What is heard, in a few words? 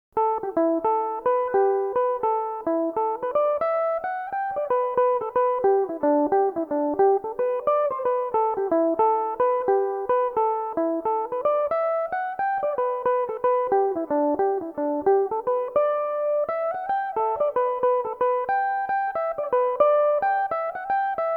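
A dorian, Irish mandolin; The Grinders; Belmuse EM-190 electric mandolin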